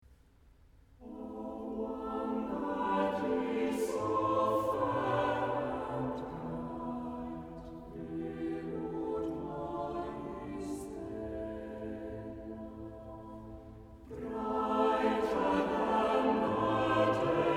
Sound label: A hymn